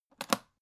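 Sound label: aluminium case small close short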